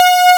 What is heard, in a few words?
AN SYN, SYN & LEAD; SAMPLE WAVE MIXING 2